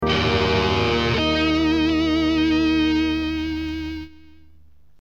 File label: Silly Guitar